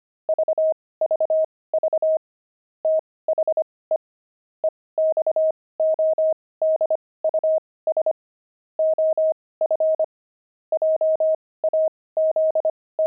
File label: pangram, intermediate pangrams files; pigeons